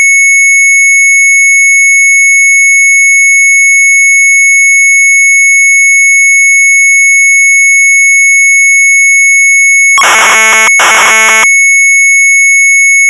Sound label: Sharp MZ800 Tape